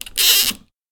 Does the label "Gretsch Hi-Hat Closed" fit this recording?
No